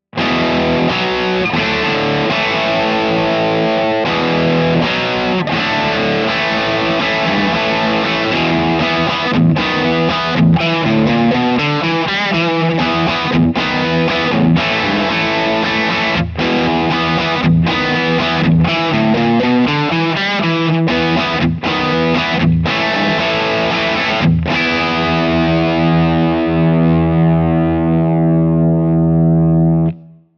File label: rock chords; jensentone